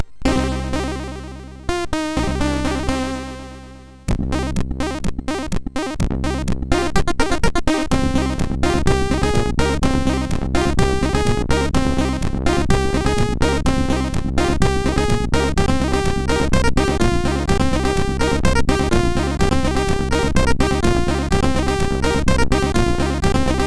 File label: intro, MOS6581R4; Magia 2; hvsc